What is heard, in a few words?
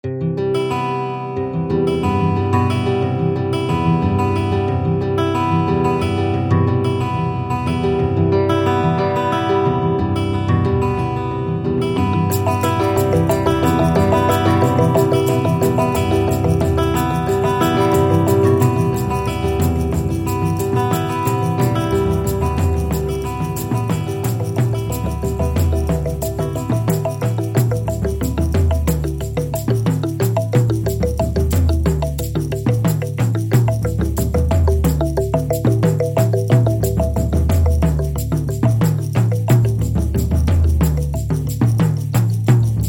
Ethno; Ethno ReFill Demo A